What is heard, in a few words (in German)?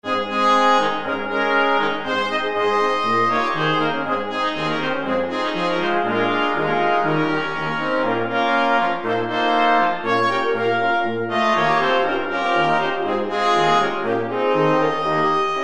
blechblasquintett